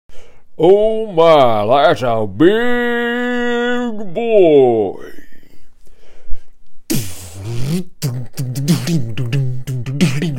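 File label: beatbox; thatsa big boy; bbx; weeklybeats 2026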